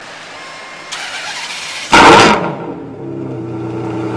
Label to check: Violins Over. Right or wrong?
Wrong